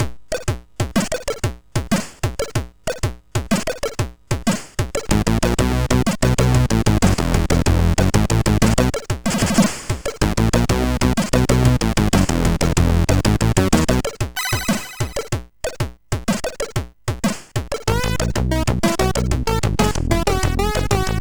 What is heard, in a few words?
Cartoon, sid MOS6581R4; Density; hvsc